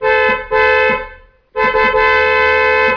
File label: vehicle